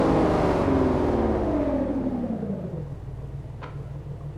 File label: throttle off; vehicles; dbs; TDMCarpack; TDMCars